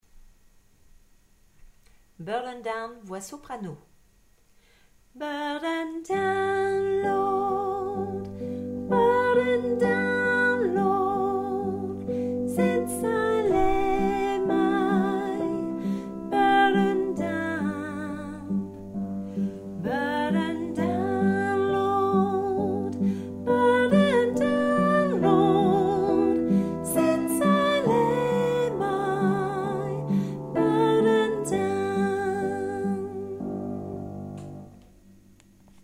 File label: burden down soprano